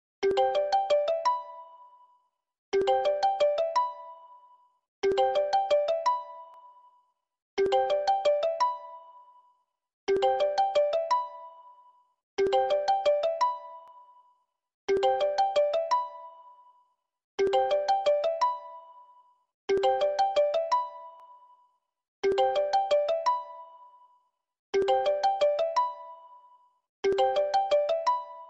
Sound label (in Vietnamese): nhac chuong cuoc goi mac dinh; line